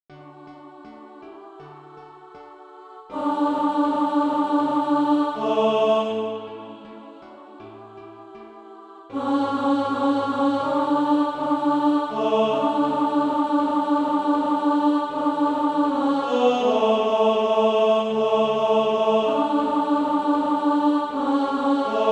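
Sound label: tenor, SATB Practice Music; Freely Freely Freely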